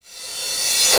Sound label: Cymbals Reverse Crash; Vengeance Samples